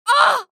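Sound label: woman grumbling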